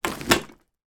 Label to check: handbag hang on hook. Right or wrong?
Right